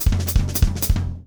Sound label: DOODLE, Drum; Steve Gadd Drumscores; GEMS; LICK GEMS